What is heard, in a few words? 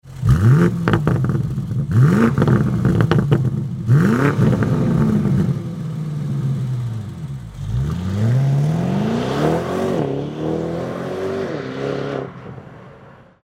M850i REMUS